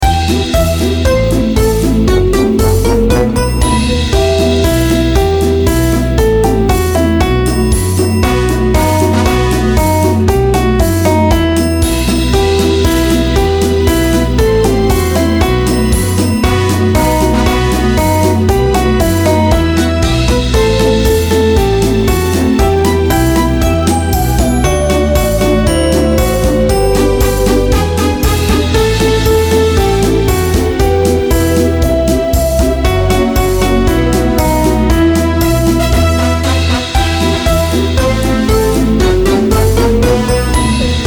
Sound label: karaoke